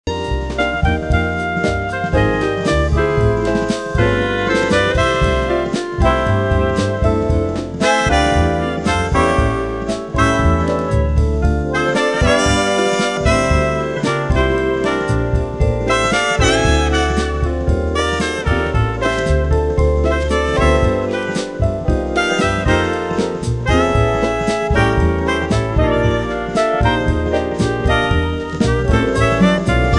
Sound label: jazz featuring a prominent trumpet solo